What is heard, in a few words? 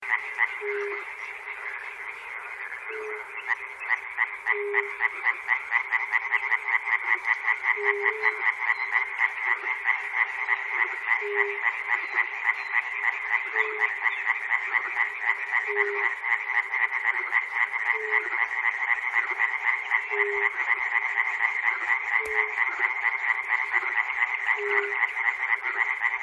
chernobyl frogs; peter cusack